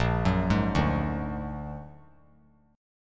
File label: A sharp Augmented 7th A sharp, Chord; A#+7 arpeggiated; JGuitar